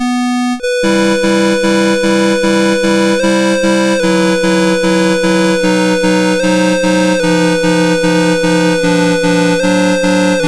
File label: Prelude in E Minor, MOS6581R3